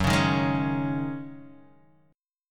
Ab 7th Suspended 4th Gb

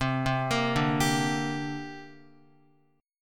C 7th Suspended 2nd, Guitar; C7sus2 arpeggiated; JGuitar

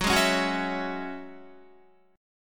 Gb 7th Suspended 2nd, Guitar, Gb7sus2 chord; strummed; JGuitar

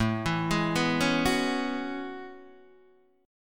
A Major 13th, Guitar DADGAD; chord

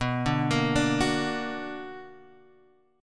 B Minor Major 7th B down, chord; arpeggiated; JGuitar

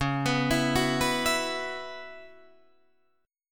Db Minor 11th, Guitar, Drop D

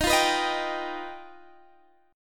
G Augmented 7th

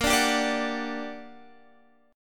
G Minor Major 7th, Bb, guitar chord

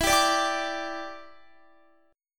E Minor 7th, down, Guitar; Em7; strummed

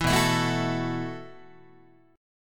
B 7th Suspended 4th B down Guitar; B7sus4; strummed

